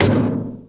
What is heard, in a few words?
Metal